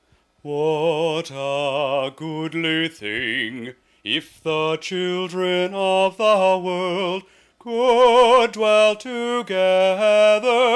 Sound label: peace round unison